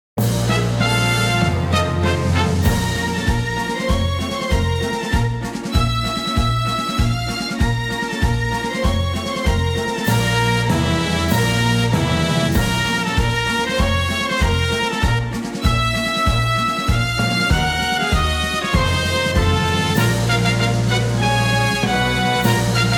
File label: Field Rocket; Pokemon Masters EX